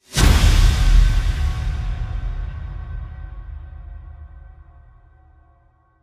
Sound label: Psycho Whoosh; Gothic Storm Music